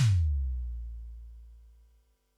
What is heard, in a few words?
tom, Pearl SC-40 Toms